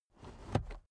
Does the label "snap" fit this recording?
No